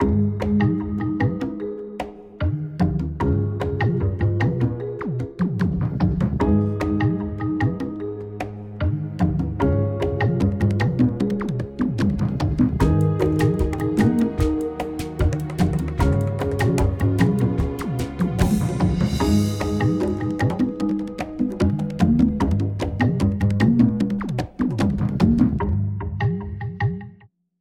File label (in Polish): dzwonek American